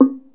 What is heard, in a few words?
kempli, gamelan; gravityharps